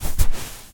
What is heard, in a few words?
footstep sand walk; MONO; ProSoundCollection v1 3